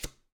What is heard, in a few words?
card flip; Microsoft Solitaire Collection